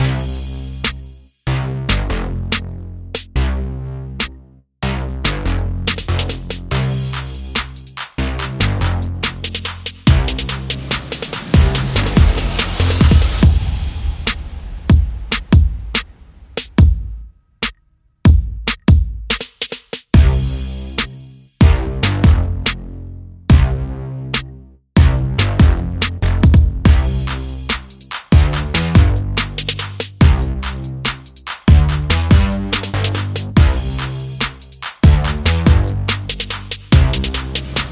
Drums and Bass and SFX Only

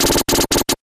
Quick Flutter, Taylor Grand

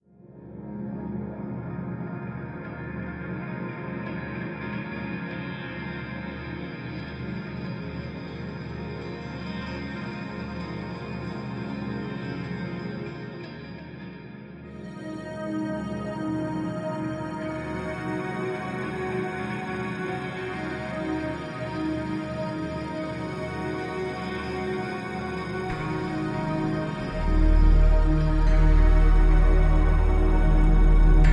Drone, Light

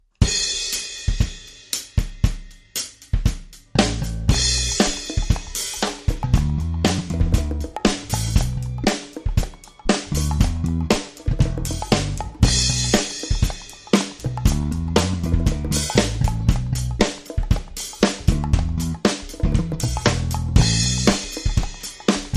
Drum & Bass

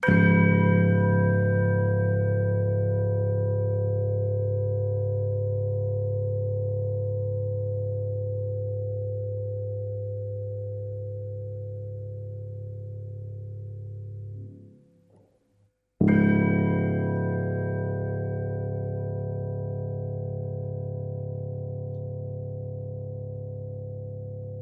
Piano & Bells

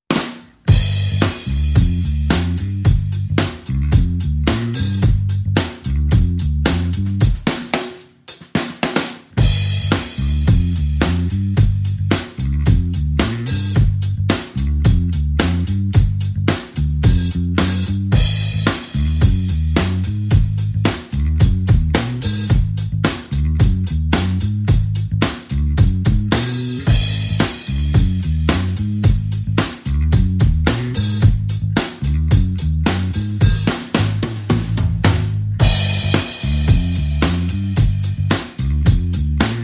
Drums and Bass Only

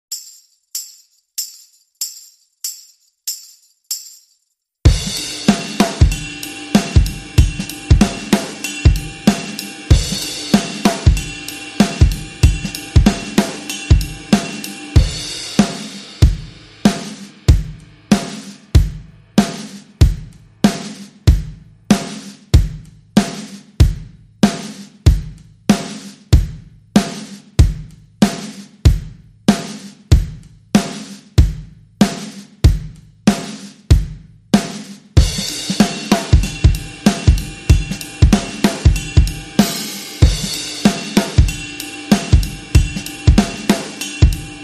Drums

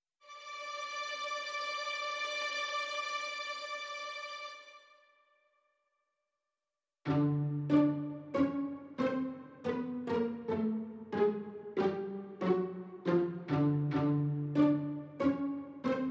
Strings Only

